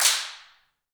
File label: handclaps, Heart of Africa; Spectrasonics; Heart of Africa 1